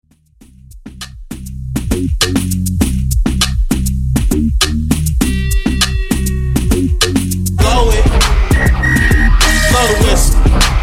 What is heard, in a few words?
Tap In Segue